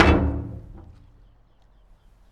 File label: Jump On Big Rusty Plate Construction Site